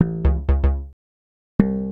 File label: HOUSE BASS 125 A